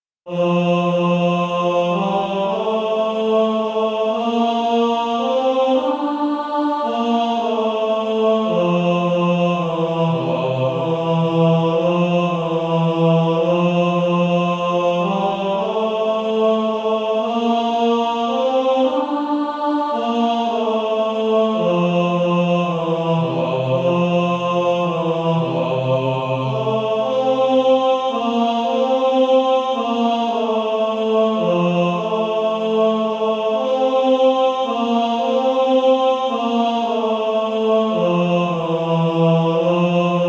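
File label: Male Choir, bass 1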